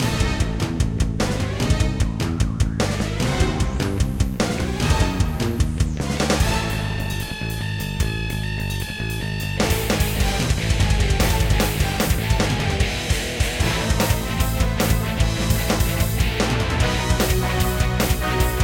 Cave; Caligo Chaser; Android

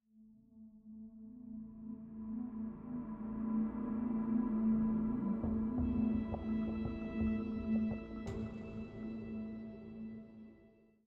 Military High 85bpm

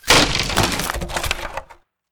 wood break

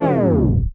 Tape Stop

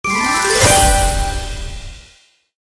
Award screen Big; Candy Crush Soda; Gofishing

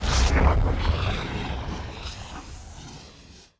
lava burst

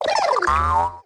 wrong

